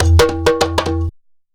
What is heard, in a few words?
sabar groove; Best Service; World Colours